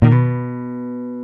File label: Jazz Guitar